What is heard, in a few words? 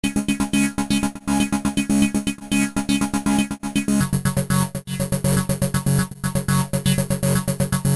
Lead PeeWee Lead scrambled; microq